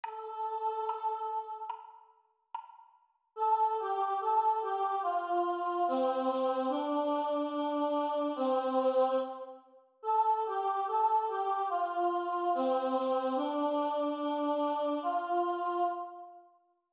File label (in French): Chant Vx Seule